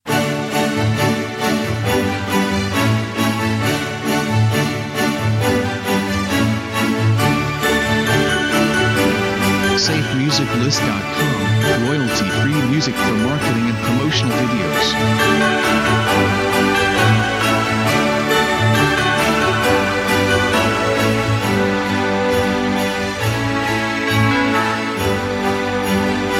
Christmas Adventure

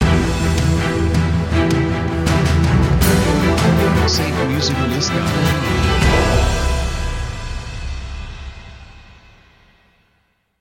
Action Crescendo Intro; SafeMusicList

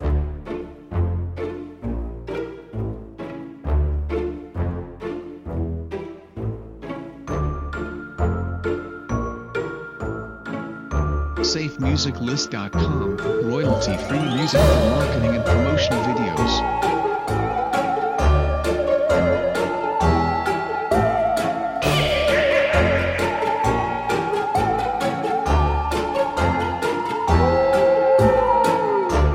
Halloween Story

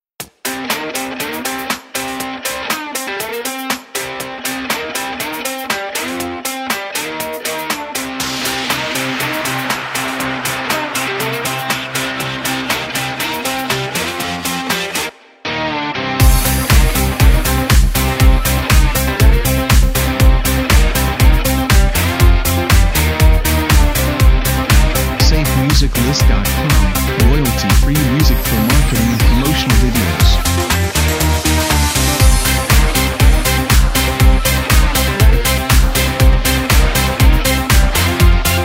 Angry Dancer; SafeMusicList

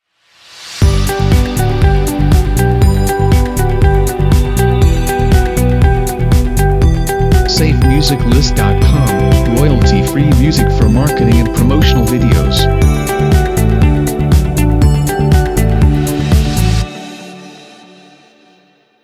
Uplifting Corporate Bumpers

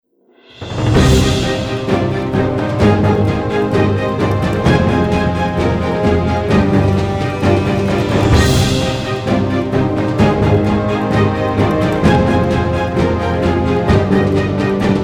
Dramatic News; SafeMusicList

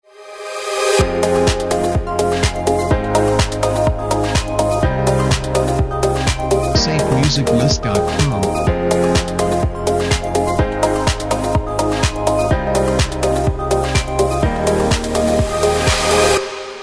Digital Technology Background Intros; SafeMusicList

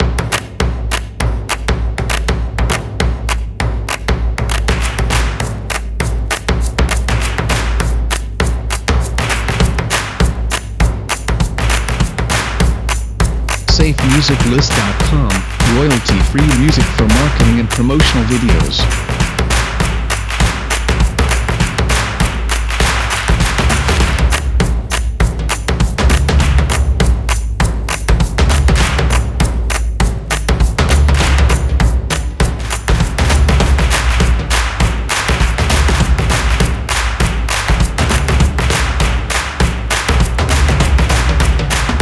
Upbeat Clapping Stomp Beat

